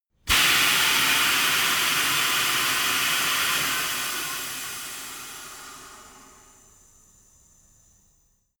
tire changing machine hydraulic hard in

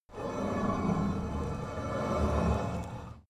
metal heavy scrape

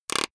plastic pressured highly short quick

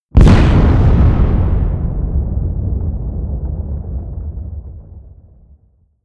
big explosion

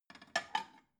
plate lift soft

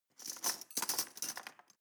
cutlery teaspoons drawer place

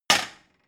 plate shelve hard

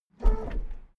car lift up short

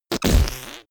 whoopee cushion fart explosive

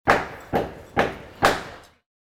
industry hammer

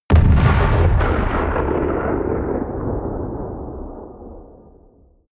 explode distant

